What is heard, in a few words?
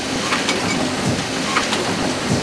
machine bookpress